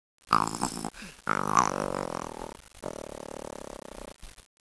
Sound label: lizard; cstrike; animals